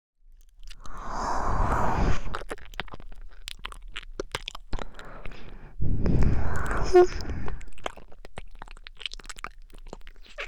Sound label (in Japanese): 耳舐め (左)